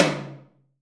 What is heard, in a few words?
TOM, DRUMKIT; Best Service; Hyper Dance